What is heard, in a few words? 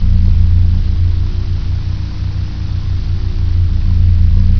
turbine; nchicago